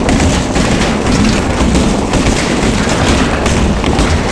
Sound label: roll metal grating, terrains; Tony Hawk's Project 8; XBOX